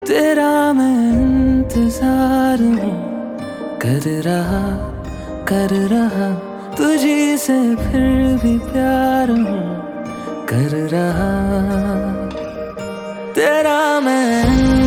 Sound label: Ringtone